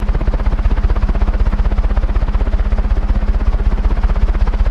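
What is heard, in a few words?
Heli Blade Whops Loop, helicopter; blades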